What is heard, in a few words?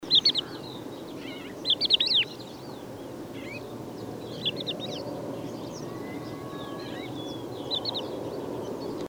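crested lark flight call; aitbuzum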